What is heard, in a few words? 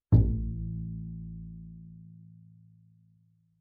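Bass pizzicato fortissimo sul E A2, Double Bass; MIS Pitches - 2014; Strings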